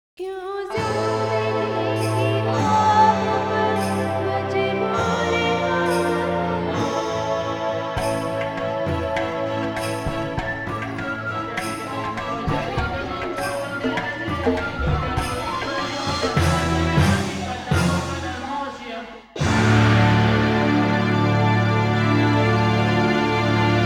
Loop; The Airs; PS1

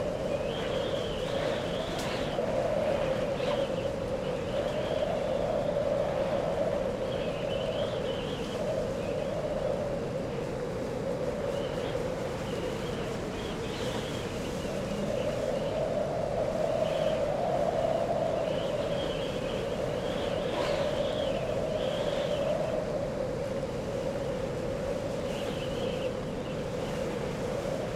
Ambience storm

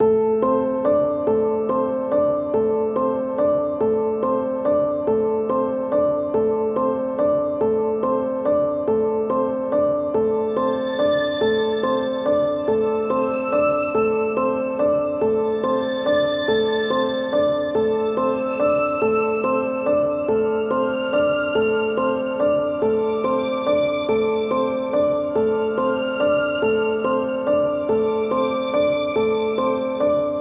West Tower City Night; Biomass

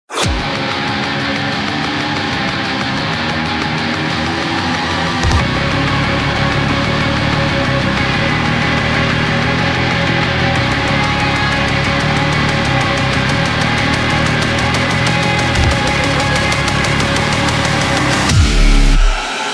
Heavy; Doom; Transistor Fist

